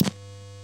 Amp Ham noise; Akai MPC2500

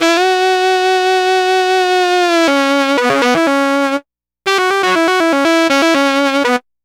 Sax Line; Dr. Fink's Funk Factory

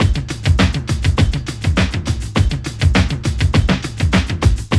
Loop; Sound & Vision - Gigapack I

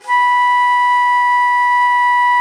T. BONSURI, India Winds

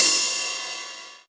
909 ride; Goldbaby Tape808 & Tape909; The Tape 909; Cym

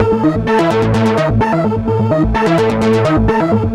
Arpeg 128, analogue circuit; musicradar

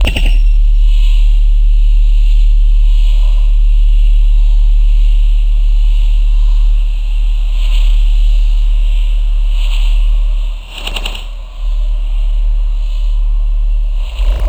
Textural Soundscape, IDM; Sonic Foundry (Sony Creative Software); Twine-IDM

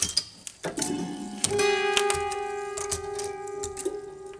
Prepared Piano; Battery 3 Sample Kits

